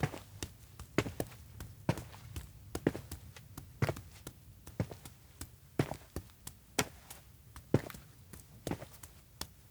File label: steps outdoor town road walk loop; tsumire; anemoi